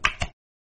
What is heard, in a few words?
button click on